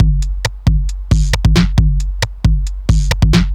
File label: Drums Techno